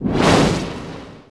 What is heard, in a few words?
speed Start; Feeding Frenzy